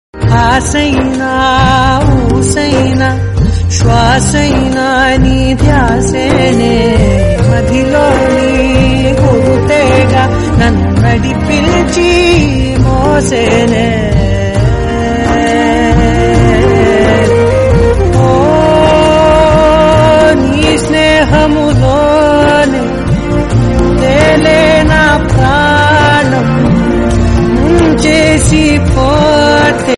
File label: Tamil Song; ringtone